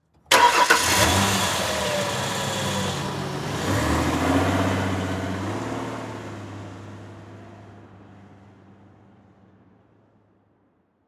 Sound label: Truck Ford Ranger Start Drive Off Quickly; transportation